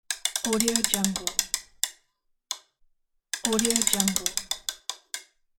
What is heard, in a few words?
roulette spinning